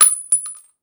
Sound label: rifle shell; weapons; Modern Warfare